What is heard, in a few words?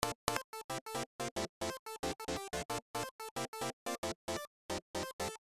actually chiptune